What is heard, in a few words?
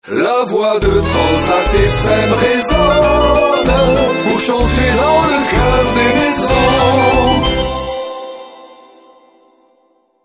jingle; transat fm